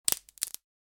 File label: wood cracking